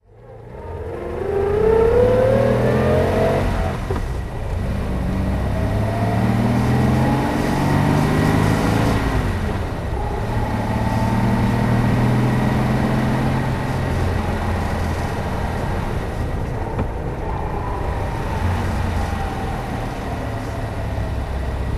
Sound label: lancia aurelia b20s driving; Lancia Aurelia B20S GT, 1956; Engine sound driving; V3D/ ClassicarImages; Marc Vorgers